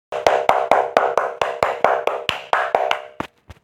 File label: super loud clapping